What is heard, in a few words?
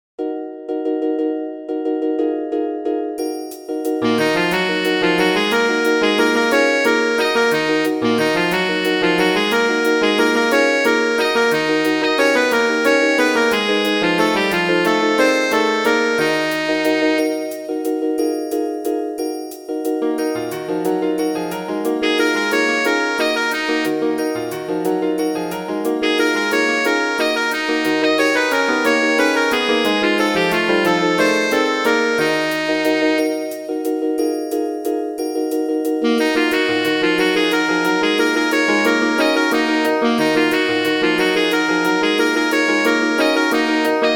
sop1, midi; britten ceremony